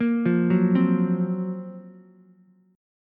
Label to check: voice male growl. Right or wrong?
Wrong